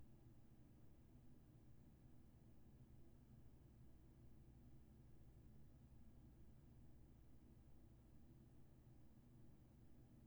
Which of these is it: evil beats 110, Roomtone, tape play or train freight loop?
Roomtone